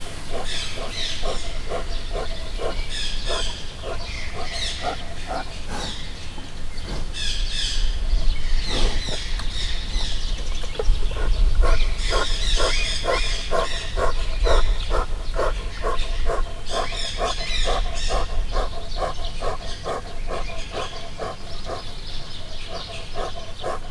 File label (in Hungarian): fölülről ahogyan szuszog, barna medve; miskolc zoo